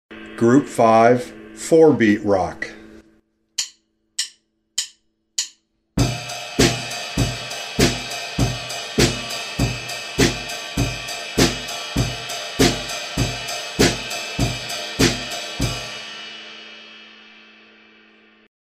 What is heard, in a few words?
four beat rock